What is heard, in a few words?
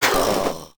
LARGE SHADOW DIE